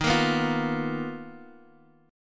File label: B-Major Sharp 11th Eb